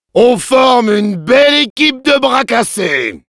demoman jeers, fr; "On forme une belle équipe de bras cassés !"

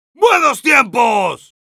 Heavy positive vocalization, es; "Buenos tiempos."

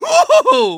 flip exert; Medic taunt

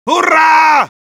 battlecry, es; Medic

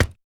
chest hit

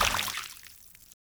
Taunt demo stagger slosh

